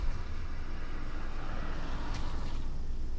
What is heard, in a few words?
car